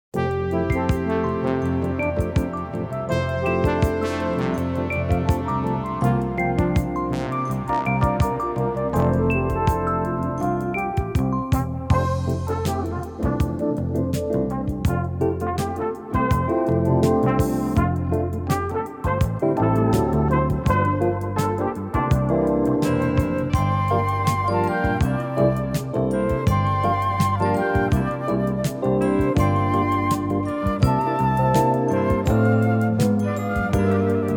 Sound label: strings